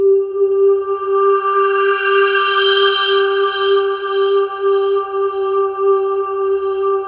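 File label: atmosphere; Sound Gallery - Charged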